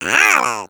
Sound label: egg bot tractor beam; player; Portal 2